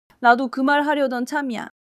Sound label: laughter; monologue